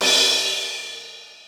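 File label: Crash, Yamaha SY22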